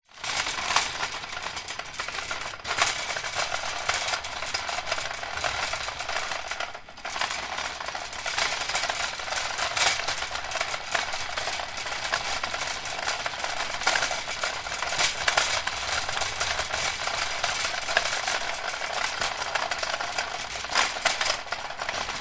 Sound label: foley shopping cart metal push concrete pushing perspective